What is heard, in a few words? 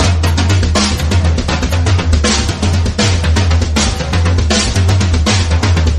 drums; horde